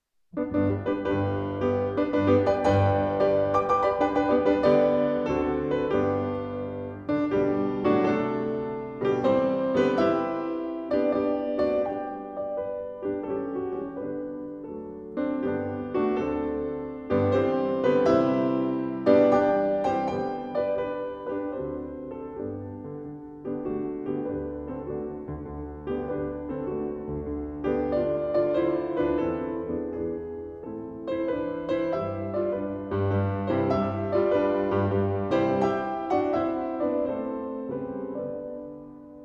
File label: Songs without Words for piano in G major; Book 5, Op. 62: No. 4; Mendelssohn